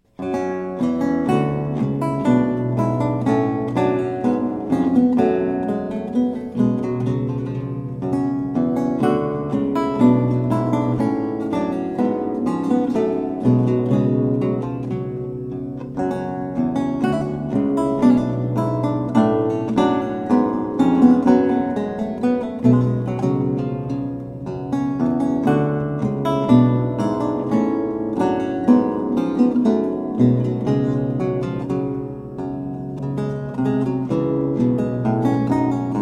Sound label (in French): Suite en Sol Mineur Gavotte, Lute Baroque; EG Baron